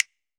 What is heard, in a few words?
F. SNAP, finger pops